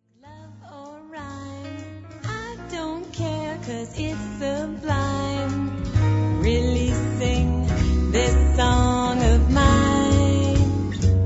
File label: laugh lo